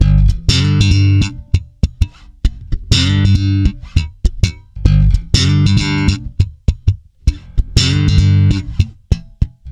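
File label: G, funk swing, bass; Spectrasonics; Bass Legends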